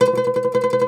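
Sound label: OUD tremolo, Plucked Strings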